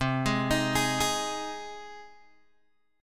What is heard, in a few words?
C Minor Sharp 5th C Guitar DADGAD